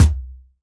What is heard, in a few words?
WORLD DRUMS MIXED DRUMS